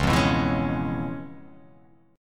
C sharp 7th Sharp 9th, C sharp, down; strummed; C#7#9; JGuitar

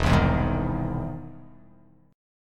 E Augmented Major 7th E, E+M7 chord; strummed; JGuitar

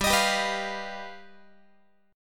G Augmented 7th, Guitar; strummed

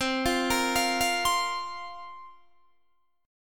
C 7th Suspended 4th, Guitar, DADGAD

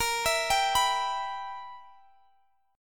E-Minor-Bb; Em/Bb; arpeggiated

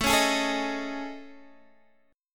A Major Sharp 11th, Guitar, Standard; AM#11 strummed

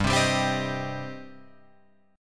G Suspended 4th Sharp 5th, Guitar Open F; Gsus4#5 strummed; JGuitar

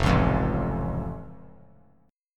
F Augmented F; strummed

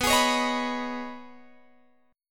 B 6th Add 9th B down Guitar Open F; B6add9; strummed

